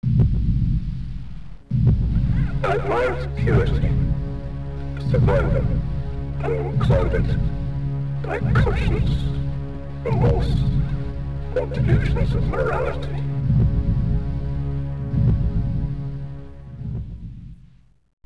startup; Alien97

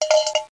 Clunk

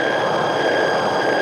Machine; Mars

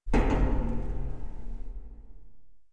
player duct

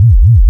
Ship Noise; Captain Bumper 0.58

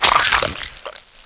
gibs

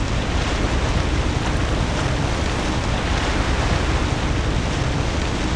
ship, vehicles; PC Gamer IT CD 40

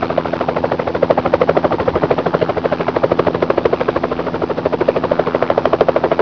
heli eng

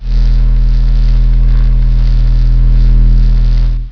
hum

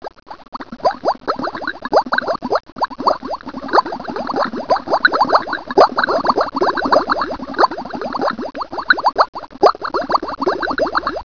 BUBBLE; Talking E-Mail

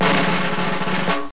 SDRUM

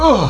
ugh; A-10 Attack! Cuba

